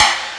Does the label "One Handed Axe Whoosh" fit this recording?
No